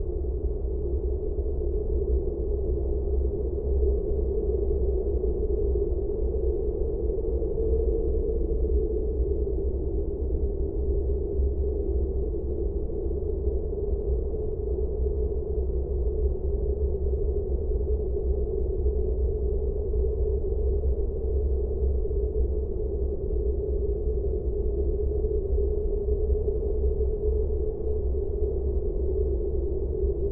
amb walk of shame

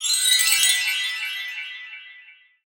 Loot Item Fly; portal quest android

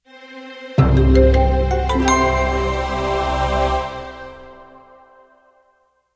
Stinger Collect Lightbulb

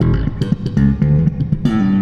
Bass wet; Nimbus Station; lego universe macos windows gamerip 2010

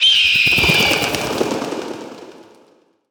Fight Button; portal quest; android; Mountain

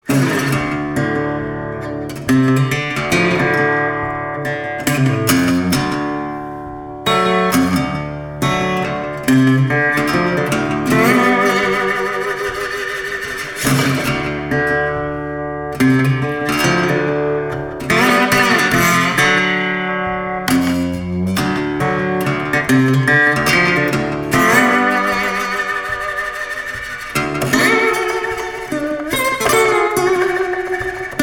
Market; Mass Effect Andromeda; Kadara

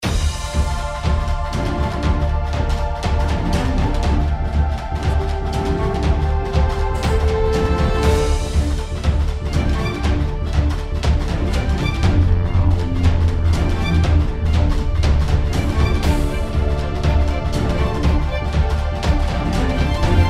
Run Music; creepy tale

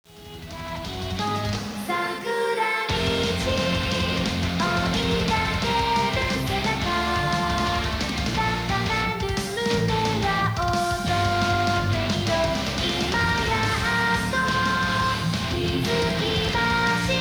karaoke bgm

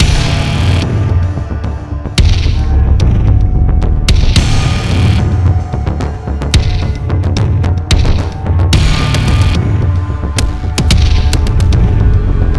Manhunt Office Loop; modern combat 4 zero hour; android

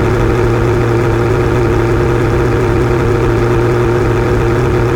Mercedes AMG GT Low, car; gamerip; Car Simulator 2; android

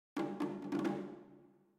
Music Battle

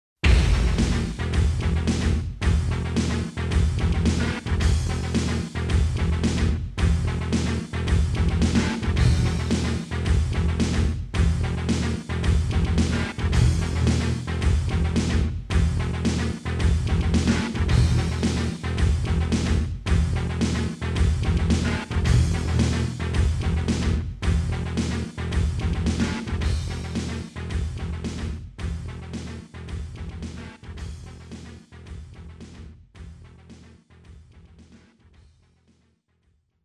KO MUSIC; buriki one world grapple tournament 99 in tokyo

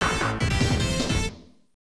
COIN; crime city; arcade

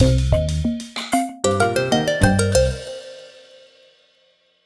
Minigame Victory; Plants vs. Zombies 2

